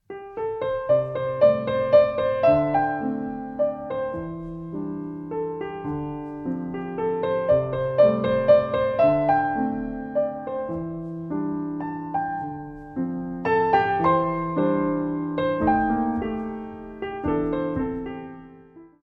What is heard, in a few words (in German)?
Jazz Walz